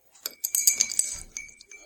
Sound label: cocktail stirrer